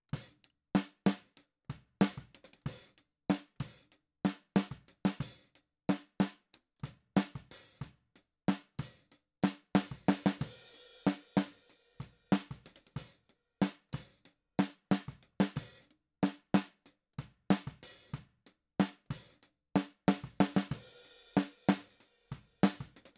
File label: Snare